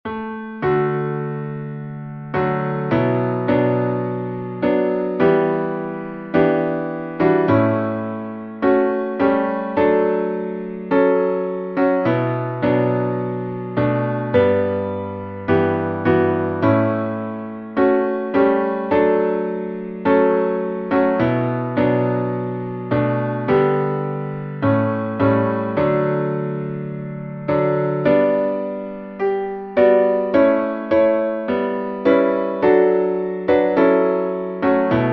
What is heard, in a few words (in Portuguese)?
salmo playback